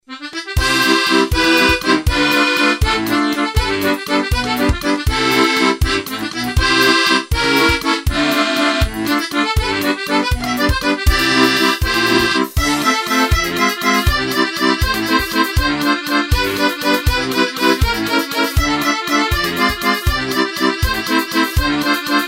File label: Bayan